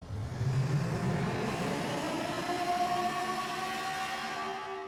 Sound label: roar softer still; miraidon